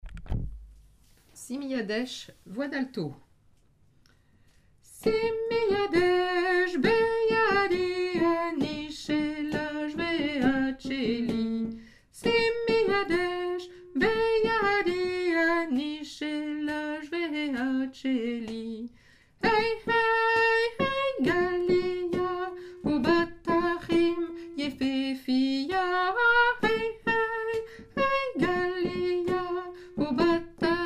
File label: alto; simi jadech